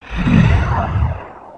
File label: idle, creatures organic